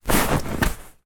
household inflated rubber ring movement hard floor